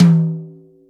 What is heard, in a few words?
long tom sample e key, Royality free tom drum single hit tuned to the E note. Loudest frequency: 374Hz; tom drum samples